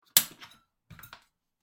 錆びれた窓の鍵を開ける音; window

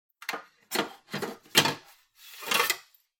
包丁を取り出す音

